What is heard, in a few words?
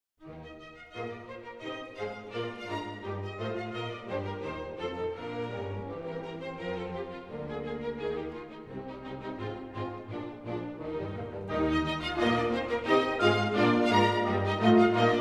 Concertos for Piano; Sondeckis; Mozart